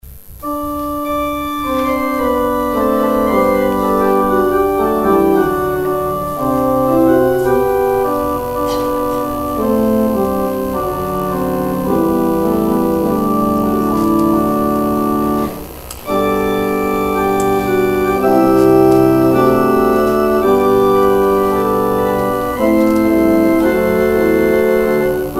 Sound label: organ outro; exit music